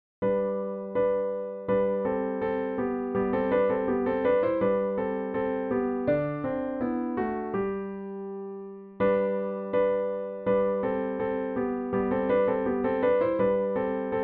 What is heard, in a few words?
piano harmony; Harmony version for Piano